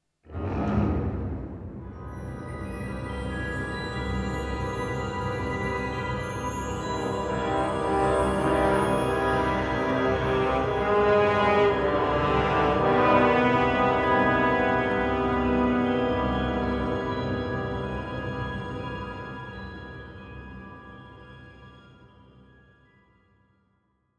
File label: low growl and tutti; Jurassic Park